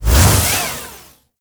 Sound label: Mech Melee Swing; Nechro